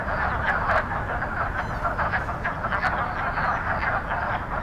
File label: Wood Frogs